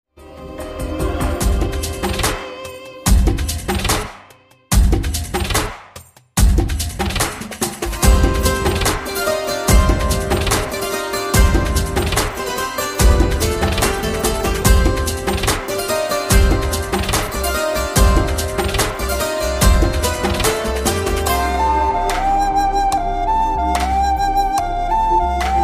best love bgm

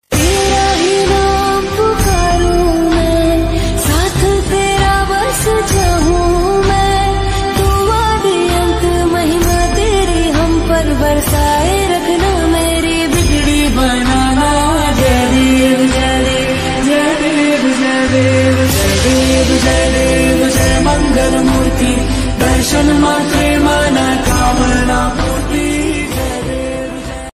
bhakti song ringtone ganpati bappa song ringtone; moriya

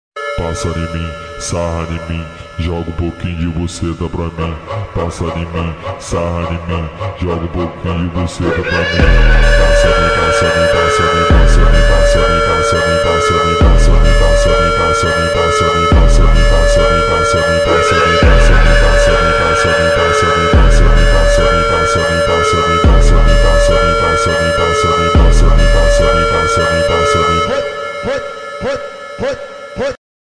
montagem beat slowed reverb ringtone

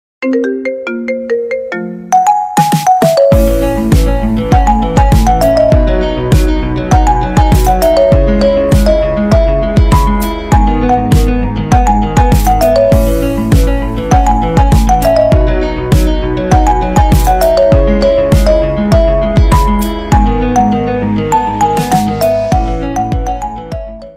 marimba ringtone bgm

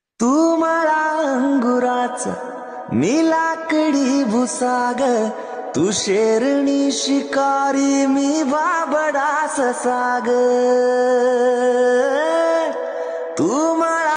love song marathi

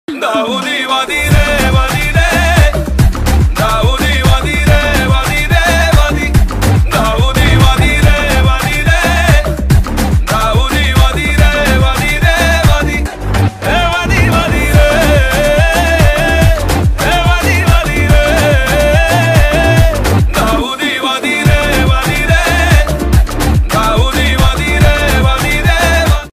song bgm ringtone; ntr

